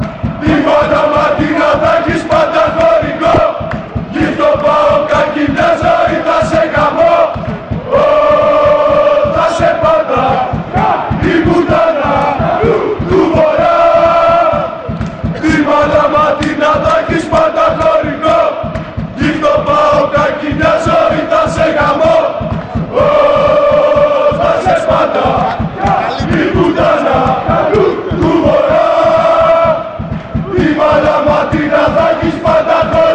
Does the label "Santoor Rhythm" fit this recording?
No